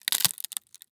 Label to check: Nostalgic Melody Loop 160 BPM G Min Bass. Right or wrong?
Wrong